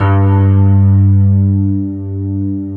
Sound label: ISLAND PN